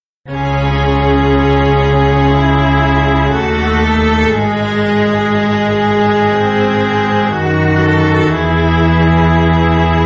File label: string quartet